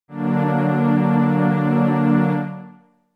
D D Augmented

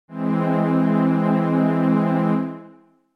E Augmented

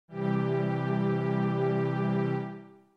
G C Major

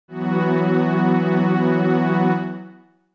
F# E Suspended2

